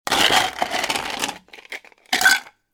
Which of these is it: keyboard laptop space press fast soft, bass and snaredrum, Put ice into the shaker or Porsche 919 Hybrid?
Put ice into the shaker